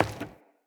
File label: Grunt Step Wood, footsteps; Enemy; Corrupted Sproutfolk